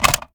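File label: sniper reload extended mag mag out; gmod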